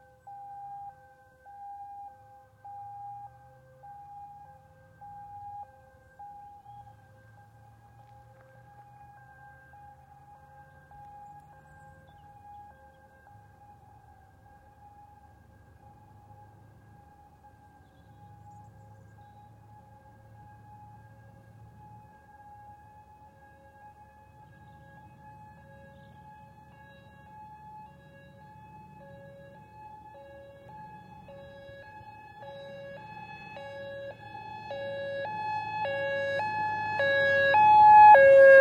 Fire engine, long approach with electronic two-tone siren, arrive, idle, off; Emergency Vehicle; BBC